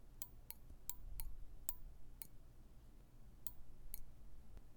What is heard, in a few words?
1959 Cherry switch